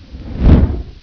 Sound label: throw; butthead; boss